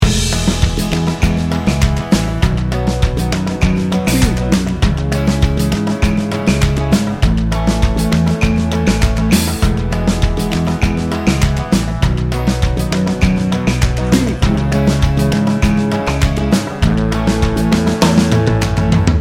loop

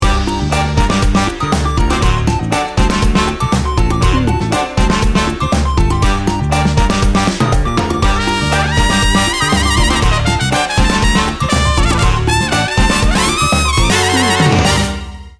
sassy salsa

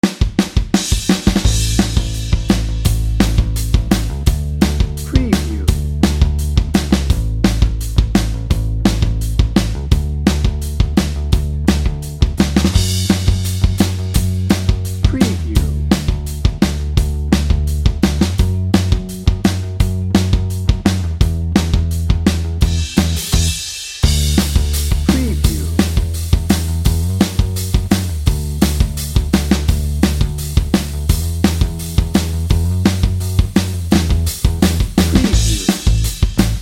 my jam no vocals

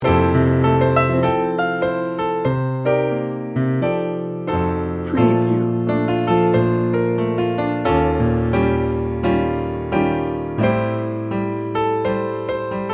piano bar loop

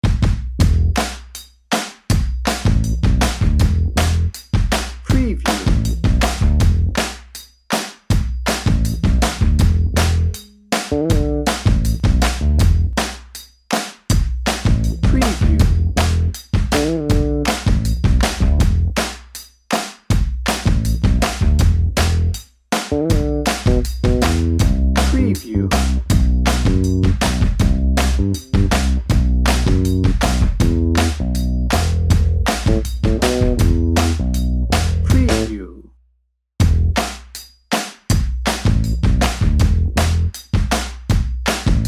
jazzy feet dnb

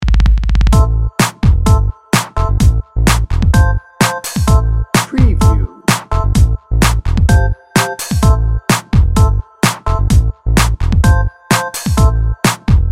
bouncy beats bed

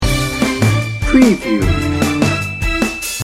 50s game show loop

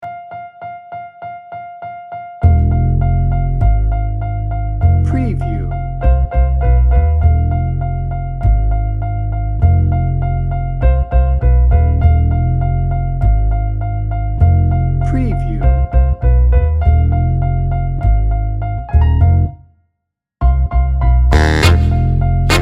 sneaky suspicion no drums

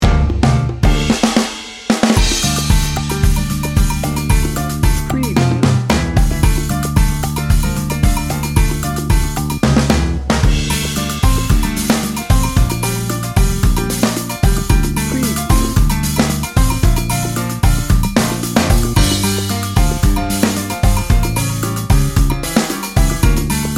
salsa montuno no horns

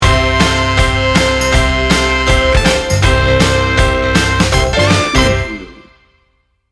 sunny in motown sting bumper

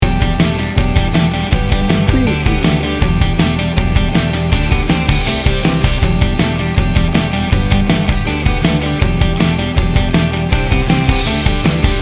indie pop rock loop

